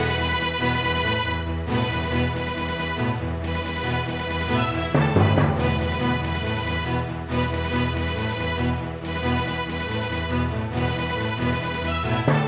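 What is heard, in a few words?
orchestra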